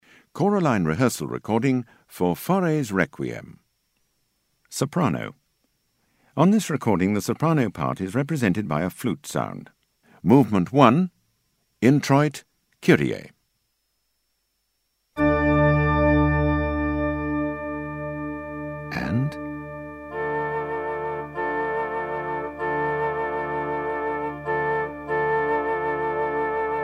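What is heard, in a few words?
Soprano; Faure Req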